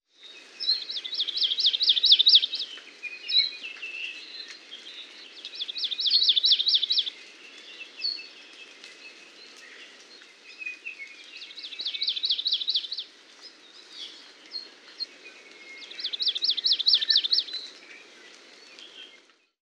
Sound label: maui amakihi